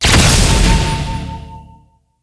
skill fail

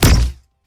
paperweight

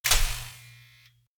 searchlight

